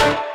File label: Synth Shot; Vengeance Samples